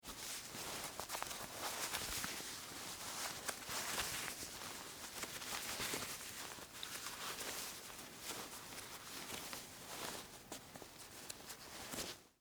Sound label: Dress Shirt Buttons, Foley, Clothes; Dressing; BlastWave